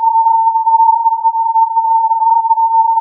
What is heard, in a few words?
filtered input